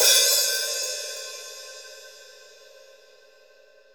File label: HH, Drums, AMB KIT; Real Mega Drums